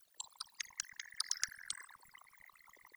denoising glock 0dB mmse

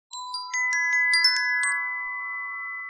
denoising glock 10dB mix

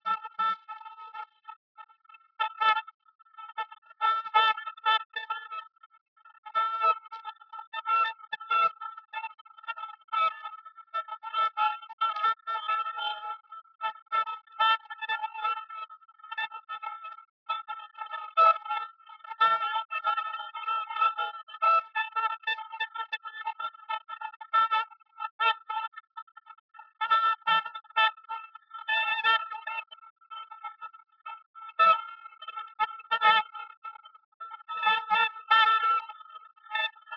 Jazz

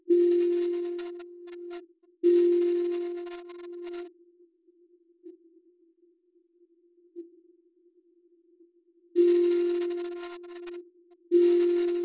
piano NMF

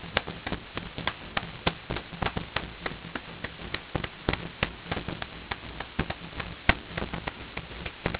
percussion

